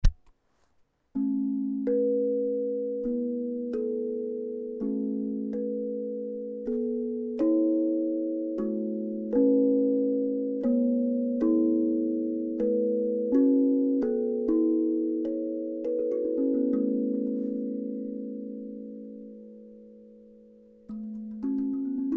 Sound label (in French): La pentatonique 432 hz